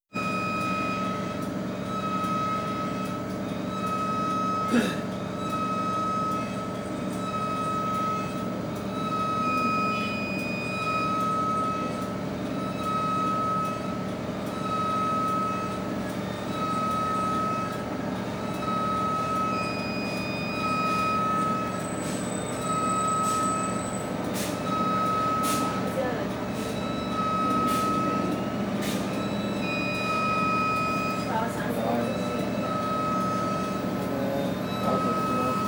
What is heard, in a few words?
bus idling